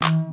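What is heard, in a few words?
Button; Star Wars Obi Wan; XBOX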